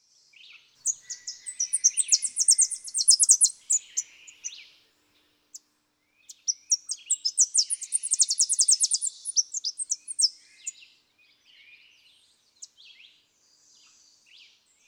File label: Tennessee Warbler